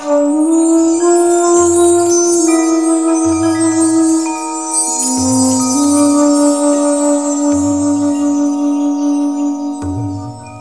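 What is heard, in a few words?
rhythm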